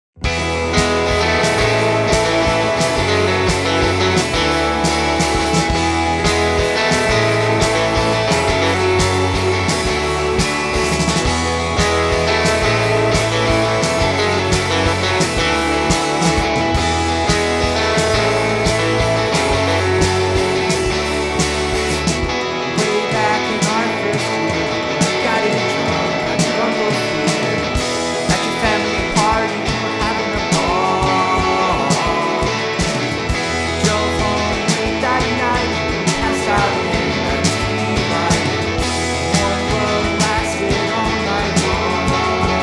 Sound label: Lawnmower, Holiday Song; xmas